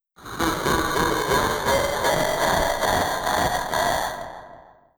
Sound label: alert